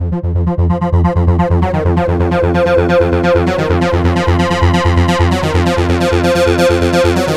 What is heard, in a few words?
acid line, synth; renoise